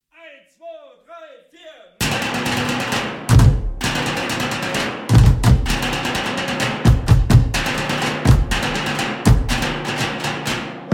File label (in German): schrott trommler